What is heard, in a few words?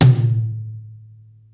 tom rock hi, Yamaha Motif ES-Series; 300 Drum Machines